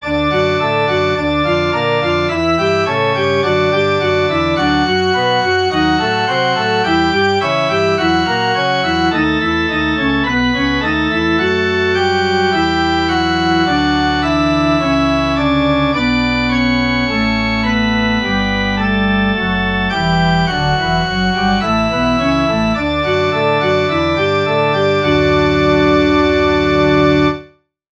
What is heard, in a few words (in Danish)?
forspil